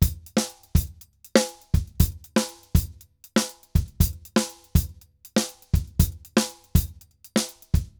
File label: Drums Bomba 120, 120 bpm; Drums & Percussion; Best Service; Festa Latina VOL-1